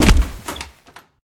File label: Door Kick; Game rip; MiSide